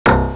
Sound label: collision